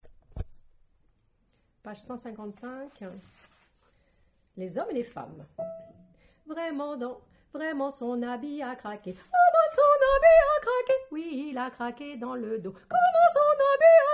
Tutti

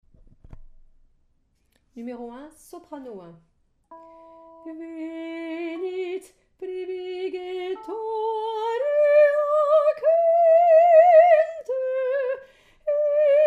Soprano; pop

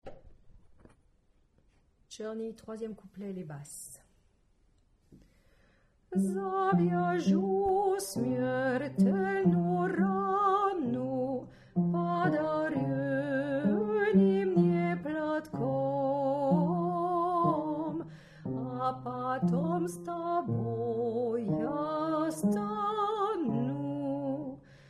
Basse

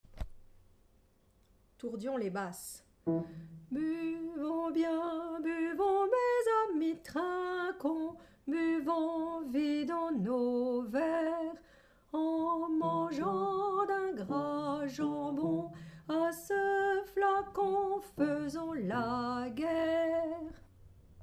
Basse; tourdion

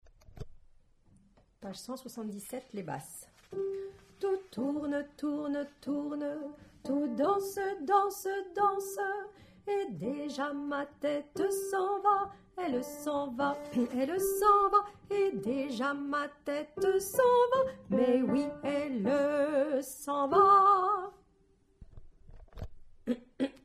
Basse

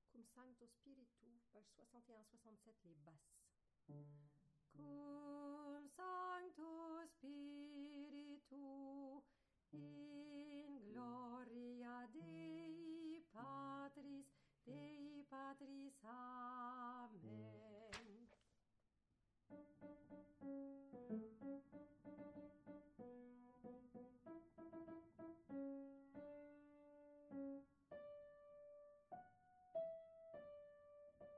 Basse

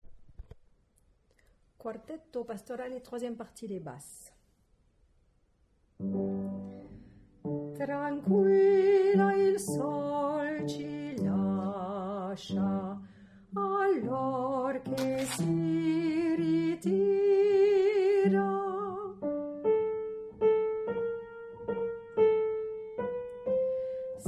Basse; quartetto

